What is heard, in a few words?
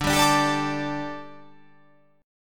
D Suspended 4th D, down